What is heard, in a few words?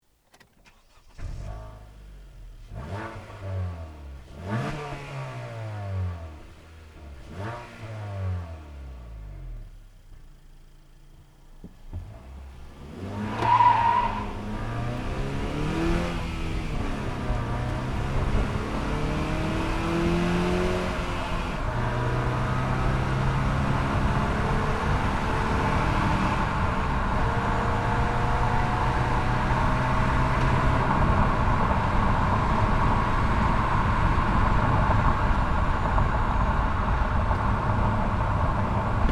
Honda Accord Sport